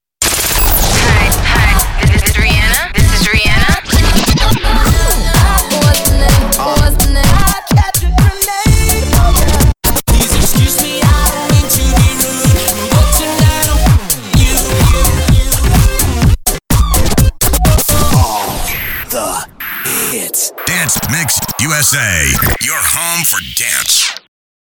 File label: beatmix; dancemixusa